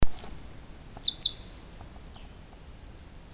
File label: warbler eastern crowned Phylloscopus coronatus